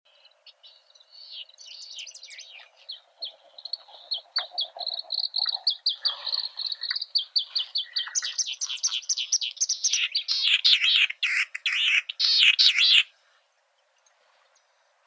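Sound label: Japanese snipe, Gallinago hardwickii; bekas yaponskii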